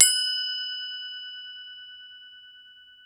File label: crotale F